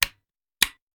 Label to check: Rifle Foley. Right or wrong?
Right